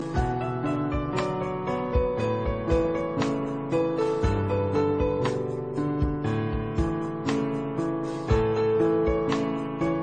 Instrumental

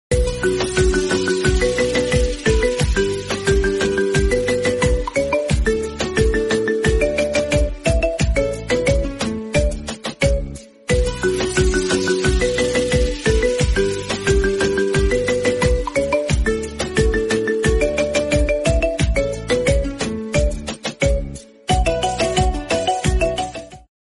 Tune Ringtone

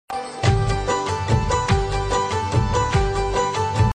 Instrument; Dabangg2

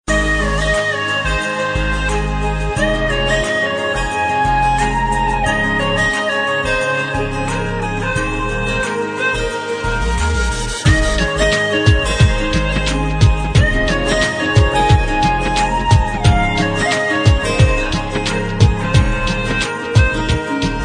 Sweet Hindi Tune

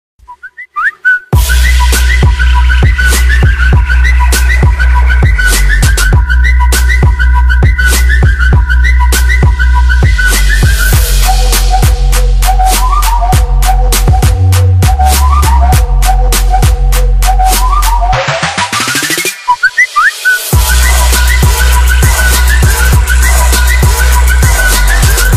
smartphone ringtones

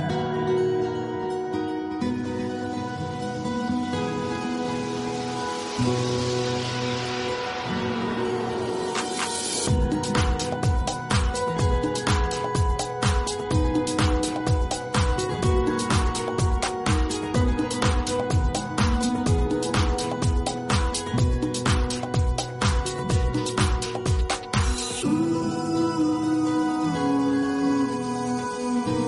Nokia Android welcome tune

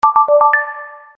Nice Notification

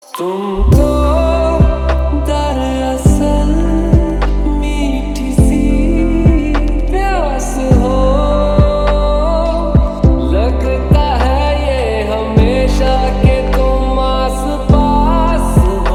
lo-fi remix

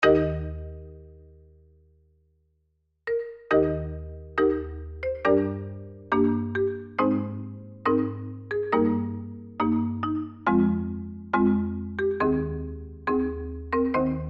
Remix; Iphone

